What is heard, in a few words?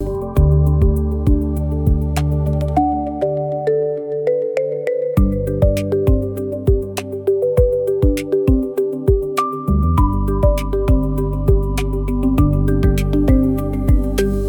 loop